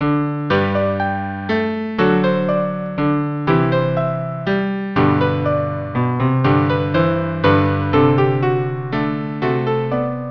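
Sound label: cello piano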